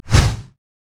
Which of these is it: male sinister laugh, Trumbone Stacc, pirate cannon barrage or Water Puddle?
pirate cannon barrage